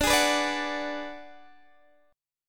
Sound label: D 6th Flat 5th D down Guitar DADGAD, chord